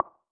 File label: tile